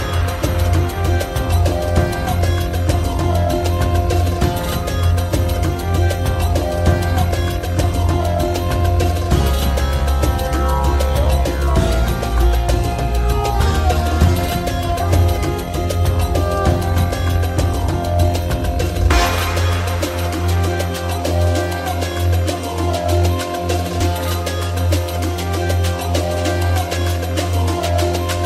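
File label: arabic bass boosted remix ringtone; islamic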